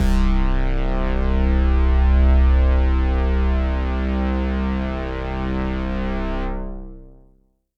Pad C, Synth Prophet One Shots; Electronisounds

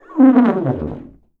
TUBA SNORT

